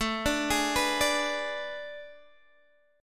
A Major 7th Suspended 2nd Suspended 4th, Guitar, DADGAD; chord